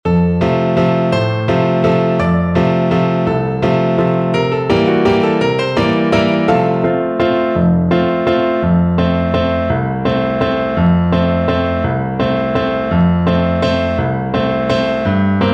Trombone

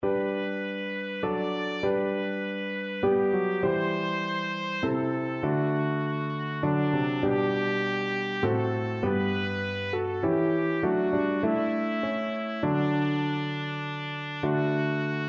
christ is made trumpet

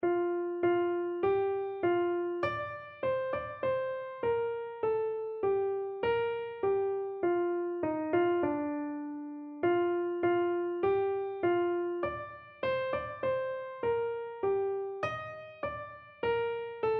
love divine, keyboard

